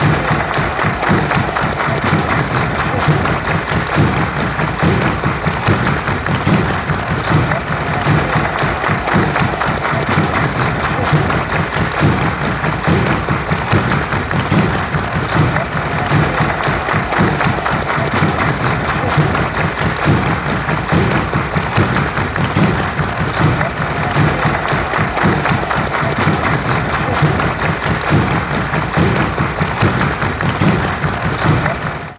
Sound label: drums